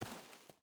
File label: footstep barefoot dirt walk; Rust